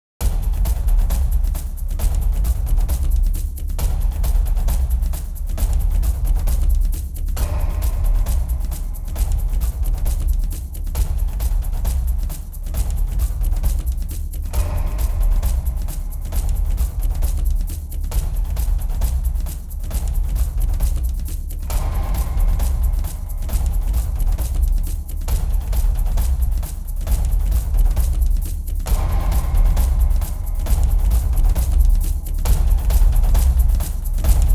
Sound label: Epic Percussion; ProScores